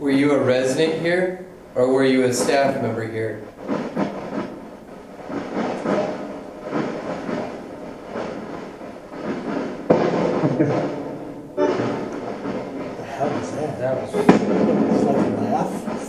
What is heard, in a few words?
Laugh